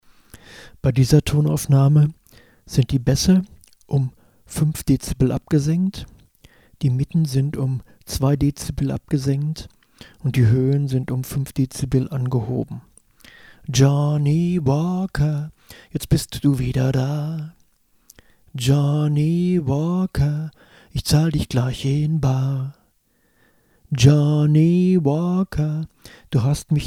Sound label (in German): Akustik Duo; selbst abmischen